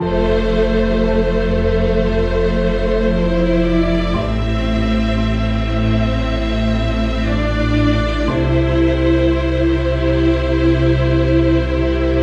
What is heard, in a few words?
Orchestral Ambient Harmony; Orchestral Ambient Music Pack